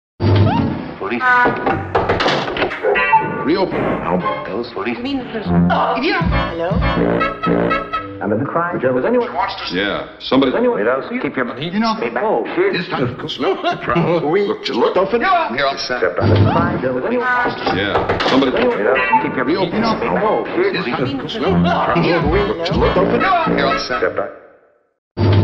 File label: Short Bits